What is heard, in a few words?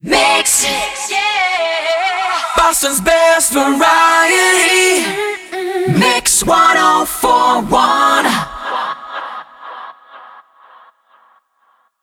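122bpm MIX, jingle; WBMX 2017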